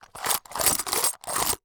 Foley Metal Pieces Plastic Tub Metal Rattles Short